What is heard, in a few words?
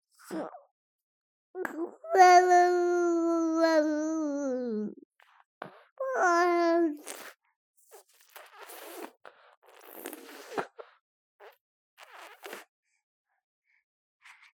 BABIES DREAM Boy 3 months Spittle; Baby Boom